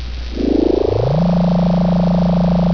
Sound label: tardis door